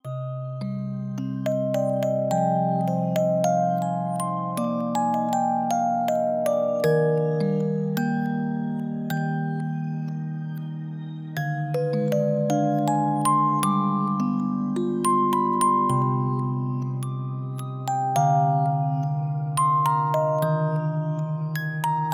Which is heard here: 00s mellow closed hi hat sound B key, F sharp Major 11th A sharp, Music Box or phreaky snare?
Music Box